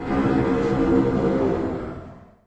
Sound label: brachiosaurus death; paleotest